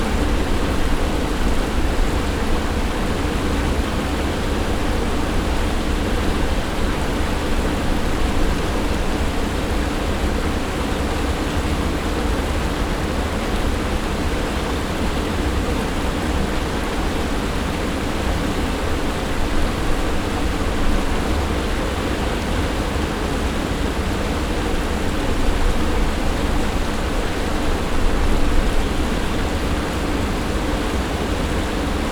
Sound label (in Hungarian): jobbra víztározó áteresz balra zúgó, patak mentén; menes viztarozo